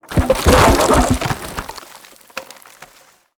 impacts; World of Tanks

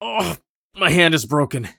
broke hand; Escape from Tarkov

